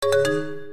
Chinese MMC removal; Nokia; Audio themes

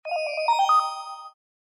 Elegant arrival, phonetones Samsung

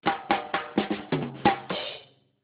Striking; Nokia; 7260 RU

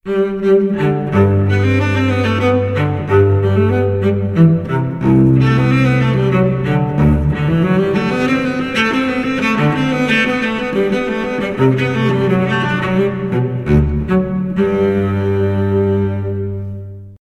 Cello in the Morning, alarms; LG Optimus G Pro F240K Lollipop

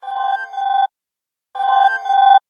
chrome alarm; Motorola Titanium; alarms

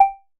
Electronic, notifications; Oppo Find X5

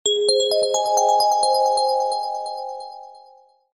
message, notifications; Gionee; Elife-E5

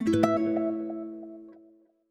Wireless Charging Started Acoustic; LG Wing 5G SKT

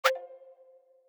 haptic folder close; Sharp; HAPTIC 3LH FOLDER CLOSE; AQUOS sense lite SH-M05

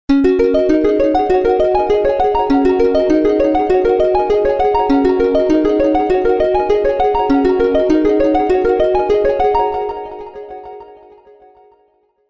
Up and Up Alarm, Digi Delay; HTC

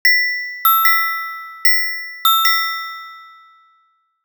Ringback; Windows Phone apps; DeviceHub Gadgets 4.4

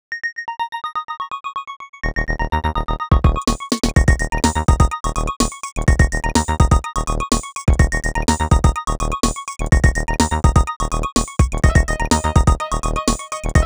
elektro; Nokia; elektro mango

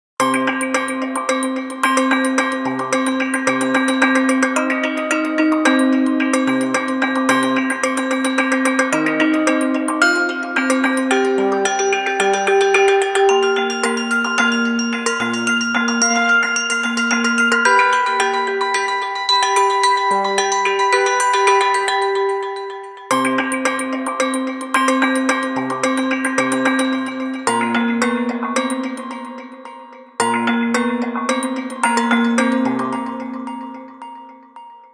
3D WindBell; SKY; IM-T100K VEGA No.5; sky ringtone

